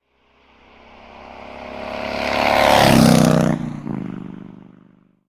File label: motorbike; Blind Drive